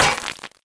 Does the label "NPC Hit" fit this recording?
Yes